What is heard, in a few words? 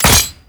Ghoul Sawman Shot End; Warframe; Grineer Ghouls